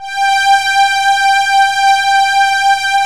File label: CHORUS STRI; E-MU Formula 4000 Series Vol. 2